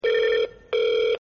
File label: radio ringtone